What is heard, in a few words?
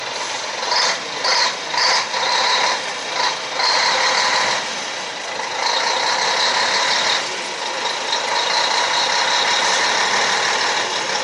Scania 4X2 360 K113 CL; Cometa